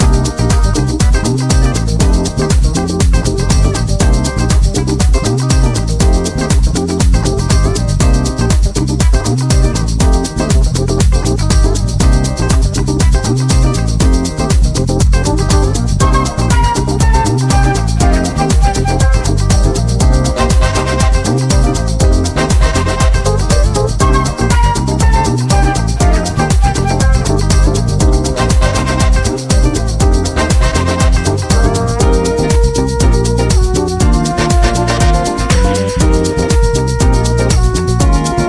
Whistle Bump

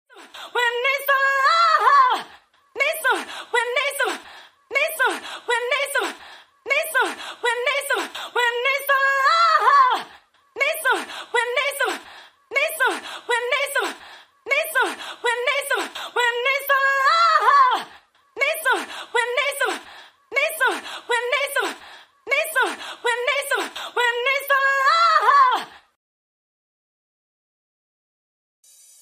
Acapella

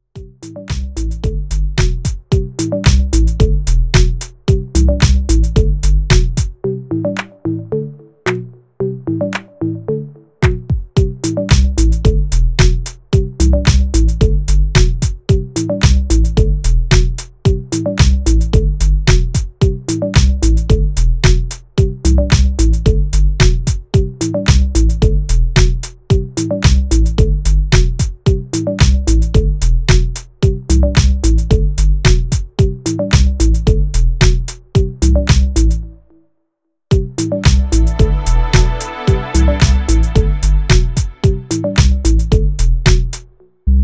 REMIX INSTRUMENTAL; afro-jazz

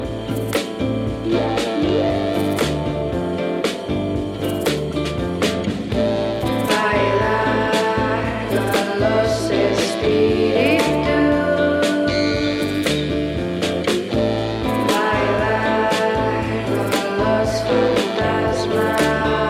ghost funk

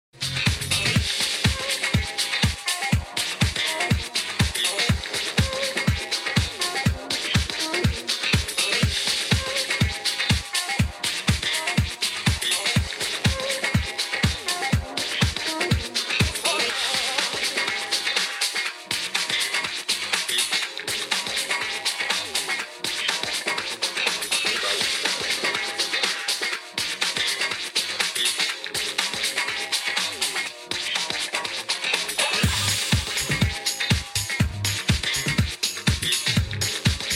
DISCO

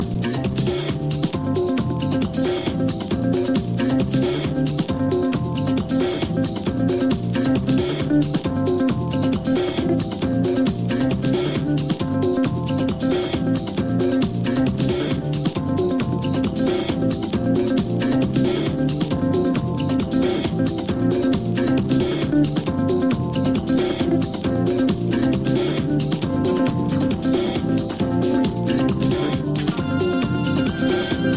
Jazz carnival

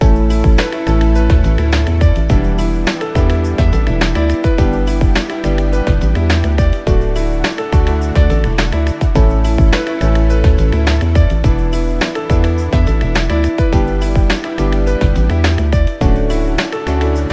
Instrumental Mix; house

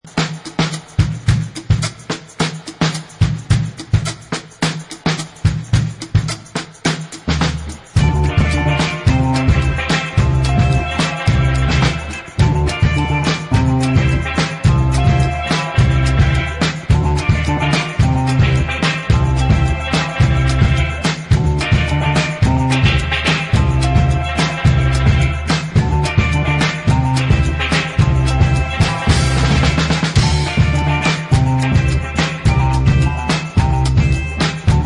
Tropical Re Version Instrumental

